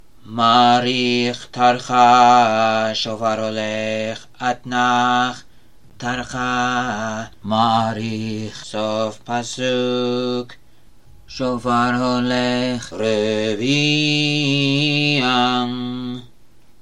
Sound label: Teamim for Torah Basic B Minor